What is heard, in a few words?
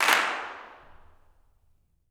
CLAPS; Vocal Planet Vol1 Gospel; CLAPS+SNAP